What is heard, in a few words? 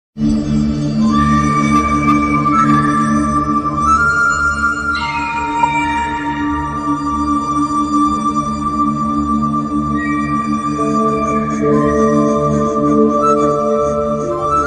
Krishna flute bgm telugu trending bgm ringtones; karthikeya 2